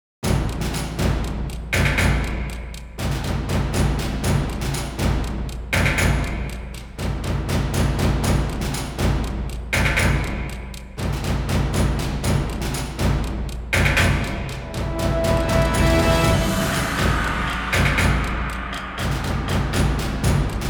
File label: Action Percussion Brass